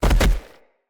Stag Gallop Vegetation, Footsteps; Animals; Foley

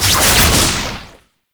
Medusa Puzzle Orb Spawn; Warframe; Duviri

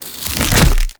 Infested Splitter Attach; Warframe; Grey Strain

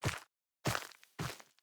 Steps; Sacrifice Forest Night End; Operator Lotus

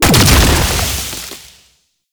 Safe Zone Item Player Explosion; Warframe; Nineteeen Ninety Nine; Deep Archimedia

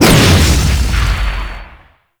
Fists Heavy Punch Cast; Warframe; Duviri; Dax; Player Abilities

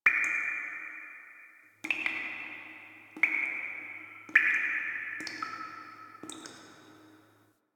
Water Drips; Nineteen Ninety Nine

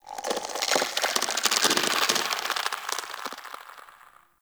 Infested Tendril Tree Creaks; Ambience; Props; Warframe; Infested Eris

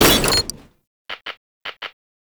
Electronics Misc Destroy; Warframe; Ambience; NineteenNinetyNine

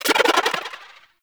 Activate; Warframe; Catbrow; Mods; Omen

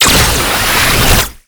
gunbrella alt charge up; Weapons; Warframe; Tenno; Koumei

